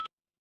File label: office card reader